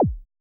JUNO HIQ, TECHNO; Drums In Your FACE!

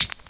HIHAT HIP; 90 s Sample CDs; Best Service Dance Mega Drums

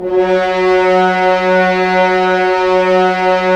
Horns; Roland - LA Composer vol. 4

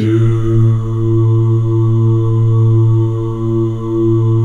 DOO B 1, Synclavier Strings; ILIO

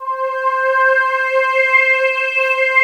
JX sweep C5

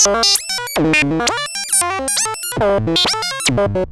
Random Compute

